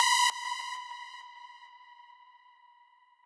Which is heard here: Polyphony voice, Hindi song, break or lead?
lead